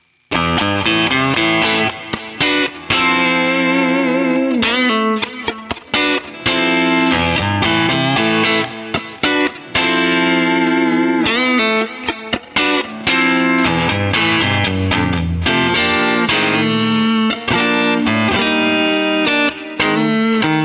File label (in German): DI Aufnahme mit Boss CS 3 vor Plug in